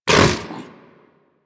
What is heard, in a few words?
Vocal Tranquillized Grunt B; Warframe; Hunting Critters; Vulpaphyla